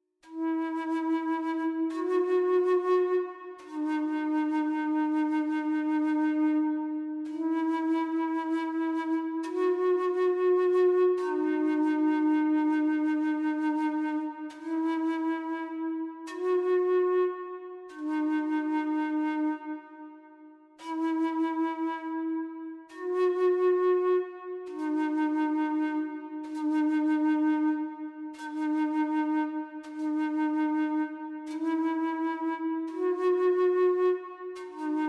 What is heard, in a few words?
native american flute